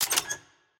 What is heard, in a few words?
Jaw Latch; Puzzle; Monkey